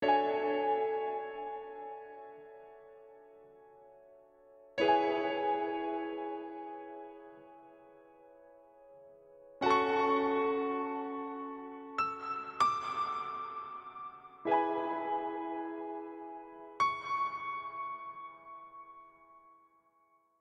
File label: Fill